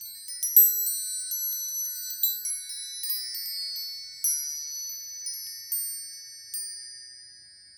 Wind Chimes Loop

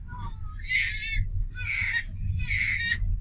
Crying Baby

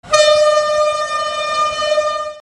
Train Horn Passenger Long Blast Intercity Express

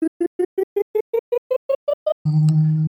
Scale

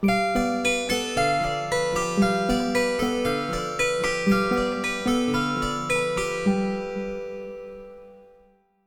Magic Harp Logo

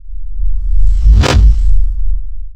Bass drum drop Sweeper

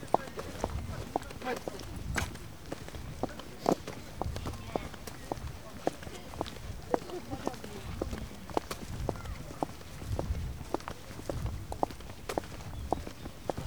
Steps On Concrete

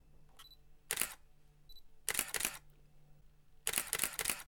Camera Shutter

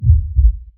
Sub Heartbeat

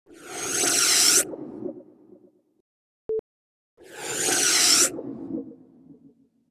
Air Swoosh Before After Doppler; Robinhood76

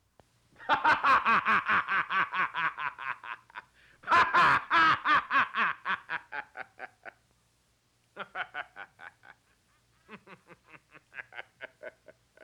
Man Laughing Heartily

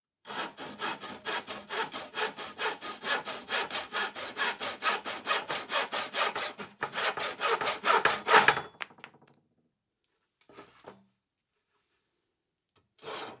Hand Saw

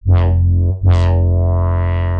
Static sine disruption

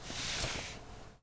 Paper Swipe Surface Mid

